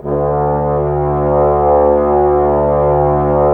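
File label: brass trombones D, Woodwind Brass Ensemble; 90 s Sample CDs; Miroslav Vitous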